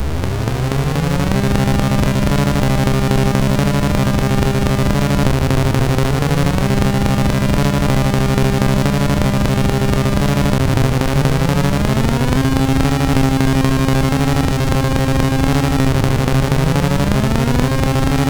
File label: sid, MOS6581R2; Tekno